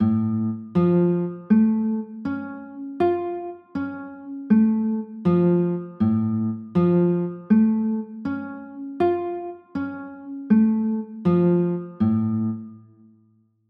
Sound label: a aug, chords